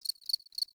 Insect Day; Warframe; Eidolon Plains; Eidolon Landscape; Ambience; Insects

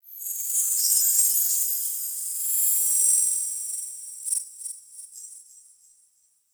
Tech Texture Small, Ambience; Warframe; Cephalon Weave

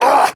Pain, Barks; Kuva Lich; Persona A

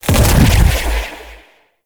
Shield Bubble Deactivate; Enemies; Abilities; Ethereal; Beacon; Warframe; Zariman; Void Angel

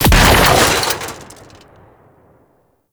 Drone Explosion; Warframe; Venus; Venus Drone Death Explosion

